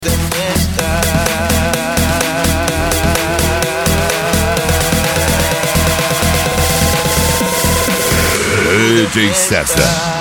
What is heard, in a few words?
mega funk